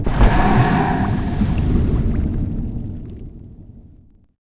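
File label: Submarine Fortify; Civ4 warlords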